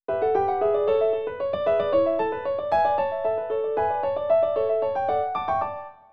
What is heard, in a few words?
baroque